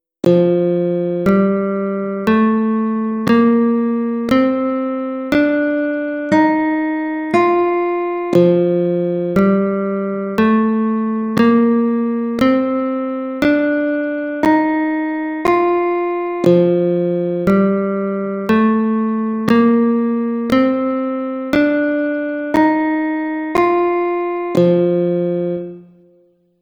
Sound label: F Mixolydisch Scale Guitar